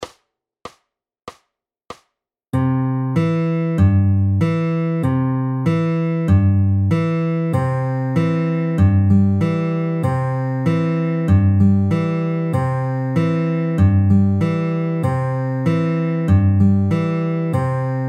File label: Slow, fingerpicking patterns